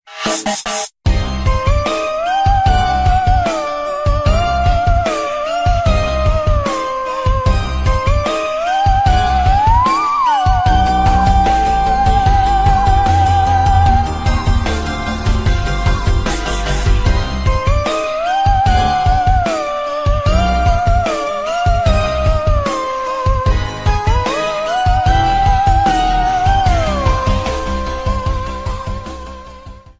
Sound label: music; MK8D; GCN; Luigis Mansion